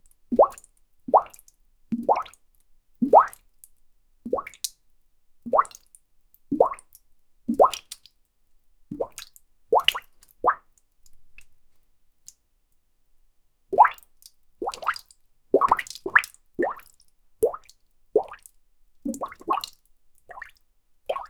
small plops like somethin, bathroom

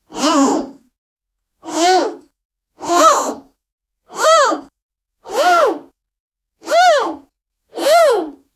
female grunt lifting heav; exercise

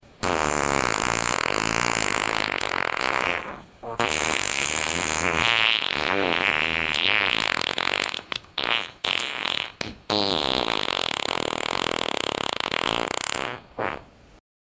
very long fart

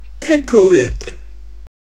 monkey talk

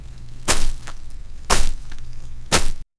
the sound of walking on, footsounds

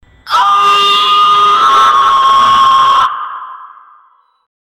the cry of a goblin

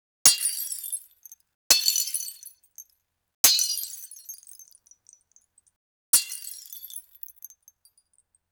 ultra clean asmr sound of

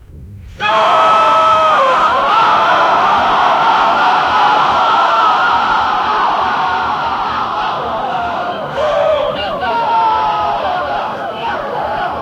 a chaotic scene of people, chaos

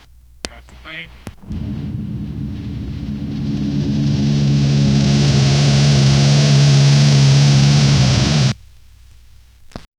deep engine rev gravel; crash

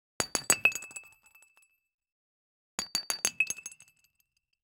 glass

